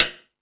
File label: Percussion FX, Drum Machines